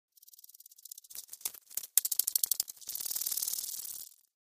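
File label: Insect Clicks Warning Threatening - Rattle Snake Type Rattles - Stag Beetle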